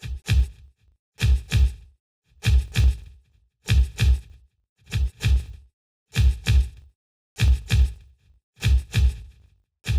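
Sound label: Polyfonic signal separated; Separation of Sound Sources by Convolutive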